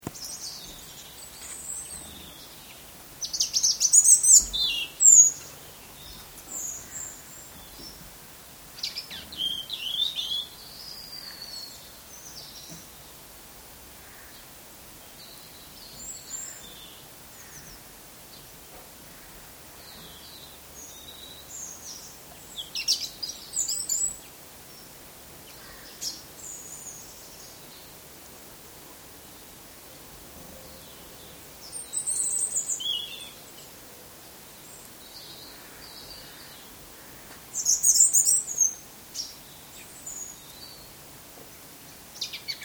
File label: robin whisper